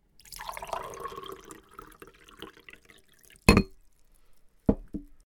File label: Pouring A Drink In A Cup